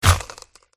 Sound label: murena attack contact bottom